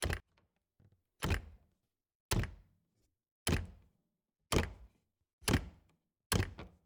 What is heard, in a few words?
Refridgerator, old fashioned, wooden, steel, brass clasps, hinges, open, various; Eiravaein Works; Vaeyan IV